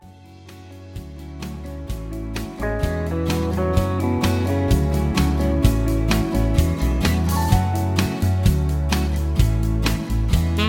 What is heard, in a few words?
Instrumental NO Leads